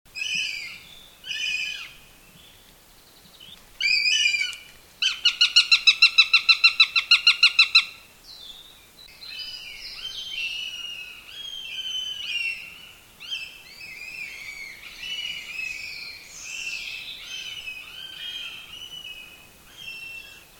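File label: Accipiter gentilis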